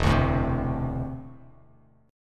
E 7th Suspended 4th Sharp 5th E down Bass Standard; strummed; chord; JGuitar